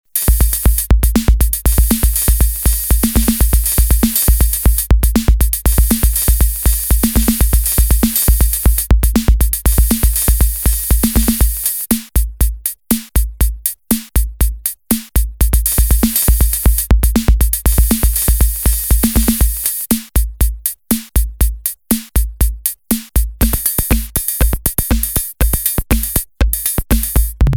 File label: mpc60 pure; eko ritmo20